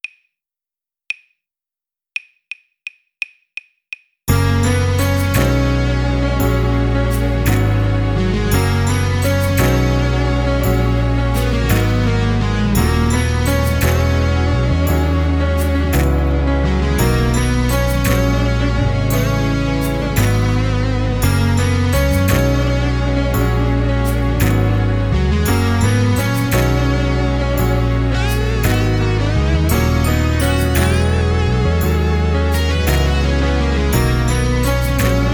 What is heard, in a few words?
drumless